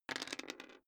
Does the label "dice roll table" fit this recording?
Yes